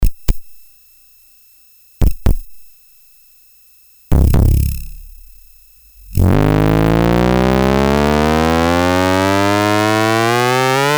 Metasonix D 2000 Pitched Drum Pitch

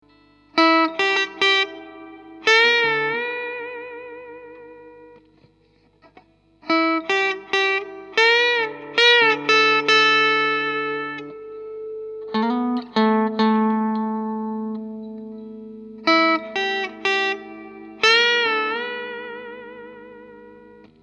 Preamp Test Mic1 RNP ISA TB12

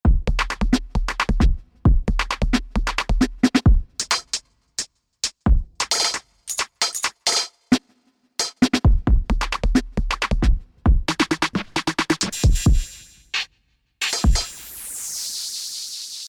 Beats; Kontakt7

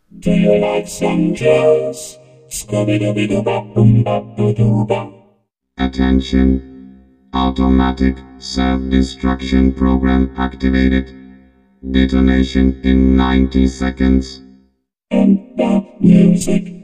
Vocodizer

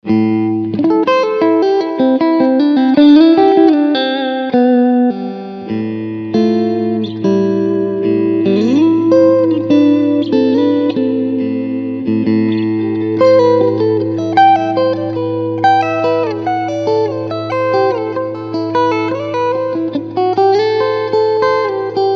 Clean Front Middle SC

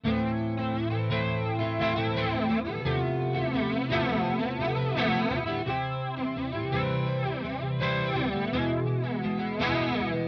Walrus Audio Polychrome Clean Voice Switch Down

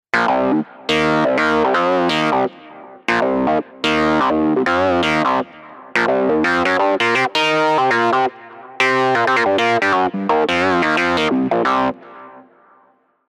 Arturia V Collection X Mini V

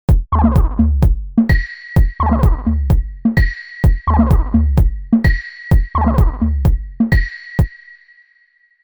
Zoom ARQ Filterhouse

